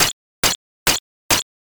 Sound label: fs wet road